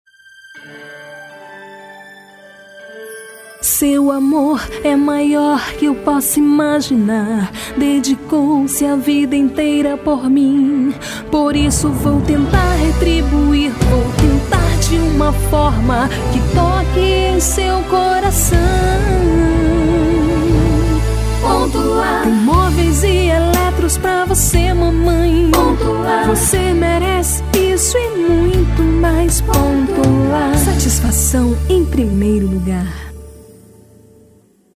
jingle; dia das mães; Ponto Lar

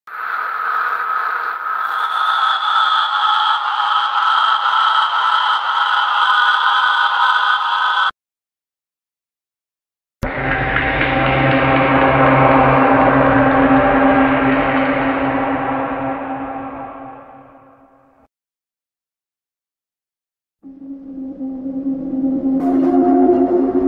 horror scary sounds, creepy sound

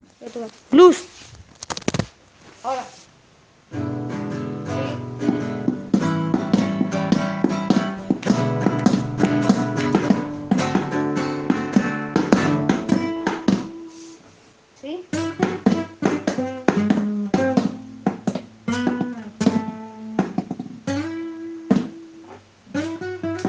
12 bar blues

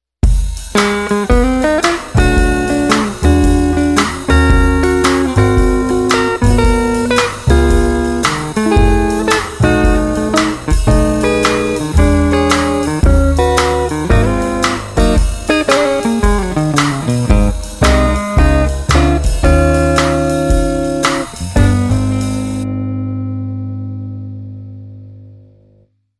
Chord Melody

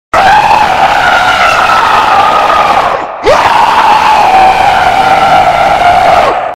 scary sounds

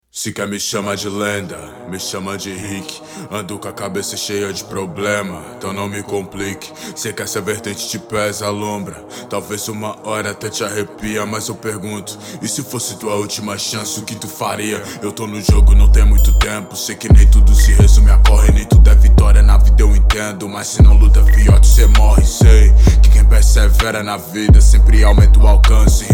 rap trap

